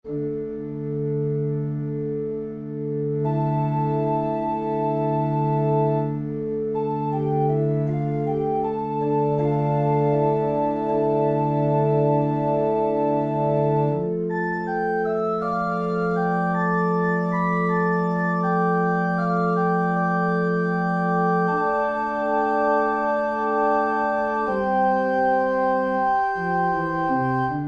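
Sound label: keyboard; Christmas Meditation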